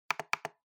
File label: Trackpad Click L Double